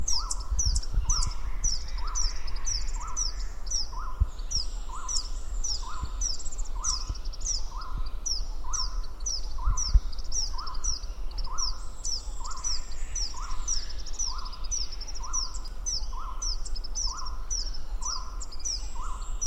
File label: Yellow rumped Honeyguide Indicator xanthonotus, call